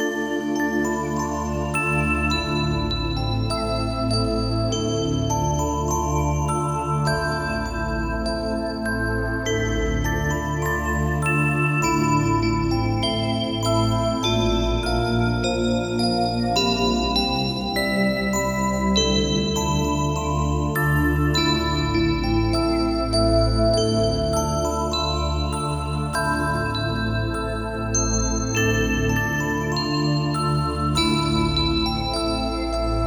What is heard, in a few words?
Realm of Fantasy, fantasy